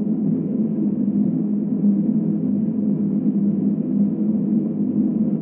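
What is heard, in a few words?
Aircrafts A-10 APU Cockpit; APU4; DCS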